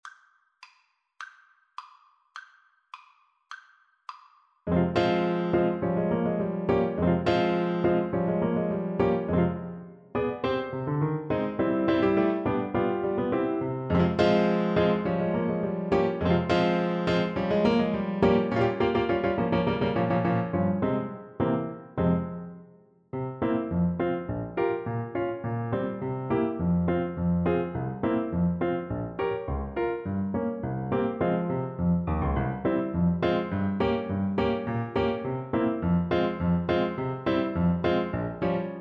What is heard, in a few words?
bohemienne, clarinet